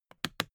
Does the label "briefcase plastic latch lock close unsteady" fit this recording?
Yes